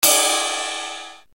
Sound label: LINN RIDE, Linn 9000